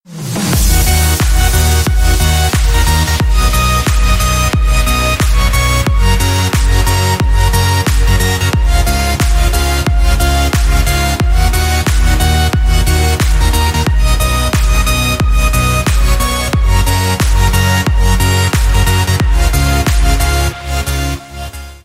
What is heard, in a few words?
Faded Bass